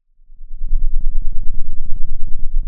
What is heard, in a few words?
pop, Sound of a thunderstorm